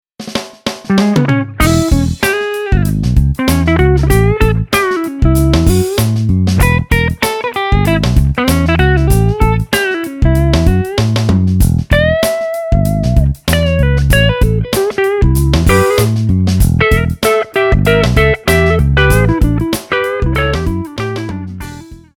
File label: patch demo